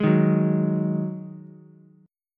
D sharp Minor Double Flat 5th D sharp, down, Ukulele; strummed; chord